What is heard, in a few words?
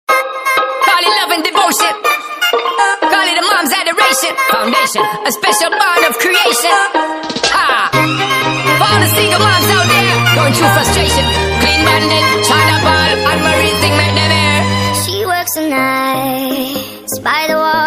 speed up nightcore song